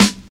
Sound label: shiny snare single hit G sharp key, snare drum sound tuned to the G# note; snare drum samples